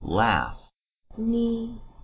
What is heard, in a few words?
laugh